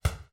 hit body; angband

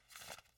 card shuffle; angband

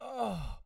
man oooh; angband

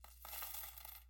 coins pour; angband

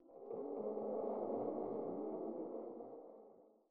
ghost wail; angband

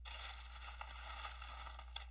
coins dump; angband

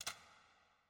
door echolock; angband

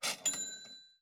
bell register; angband

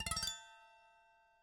tone headstock; angband

